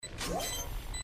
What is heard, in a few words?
Defuse Sound Effect; Valorant